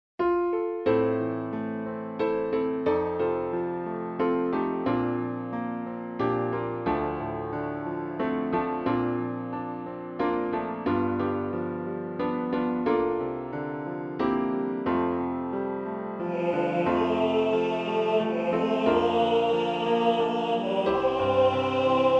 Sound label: tenor